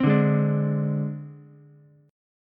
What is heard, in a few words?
Ab Major Flat 5th Ab Ukulele; AbMb5 strummed; JGuitar